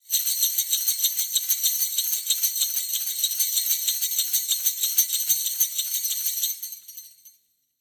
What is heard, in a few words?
jingle xmas fast